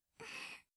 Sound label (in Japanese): hanikami, breath